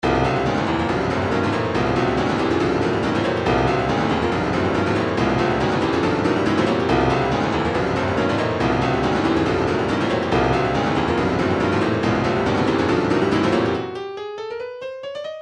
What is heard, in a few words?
retro funk, midi; Go Go; Streit1